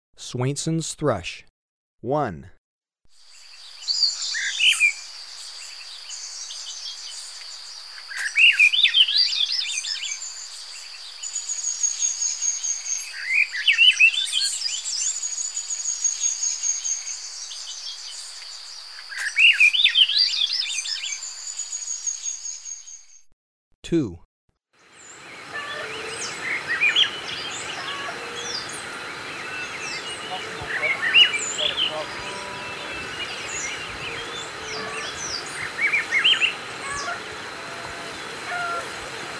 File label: Swainson's Thrush, Birds of Ecuador; S. America